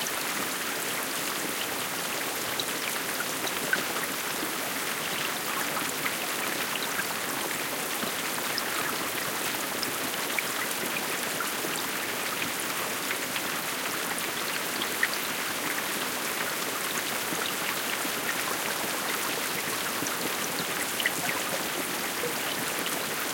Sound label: rain moderate